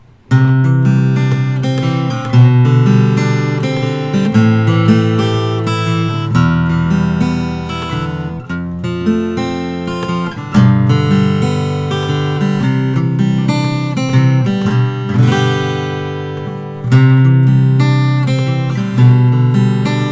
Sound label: kinscherff troubadour brazilian natural